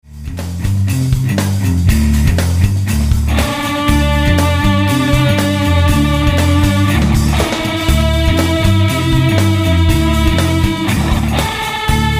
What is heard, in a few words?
Blues Groove Funk, rock